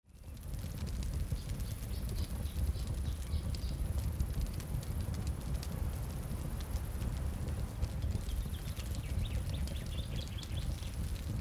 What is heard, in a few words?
Ambience; Microsoft Studios; Age of Empires III - Complete Collection; cinematics